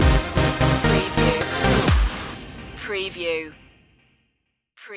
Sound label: the source stinger